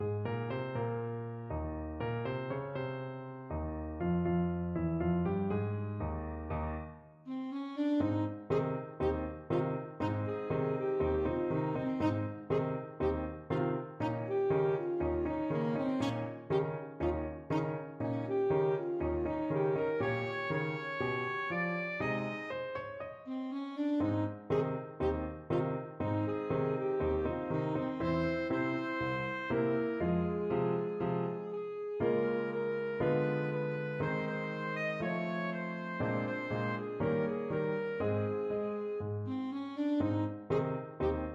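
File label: alto saxophone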